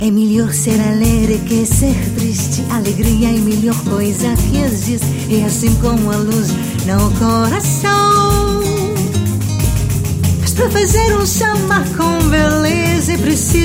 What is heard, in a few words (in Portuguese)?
samba